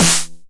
909 SD Tape Sat

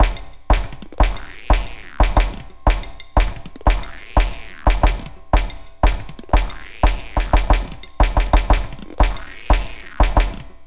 Glitched, Processed Percussion

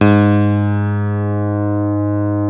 CP-80, Yamaha CP-80; Greytsounds VOL-1

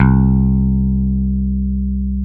DAN OPEN C3, BASS

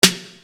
Snare Dr

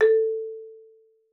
marimba A4; Xsample VOL-4

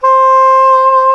SAX SOP SAX, Soprano Long; Roland LCDP07 Super Sax

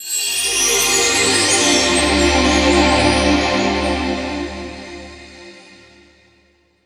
NRG Pad

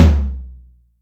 Acoustic Tom, Dry Flr, Yamaha DTXpress

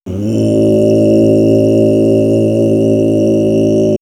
Monks, vox

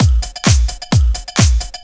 130 tribal, LOOP PERC TRIBAL; DRUMLOOPS; Ueberschall - Techno Trance Essentials

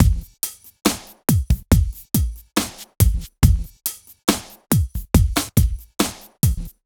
SloMo Beats, Loops 140 BPM

